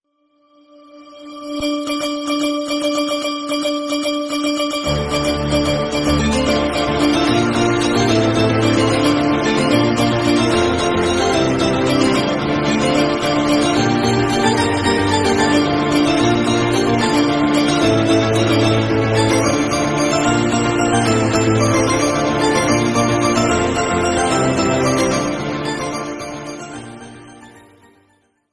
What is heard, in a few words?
flute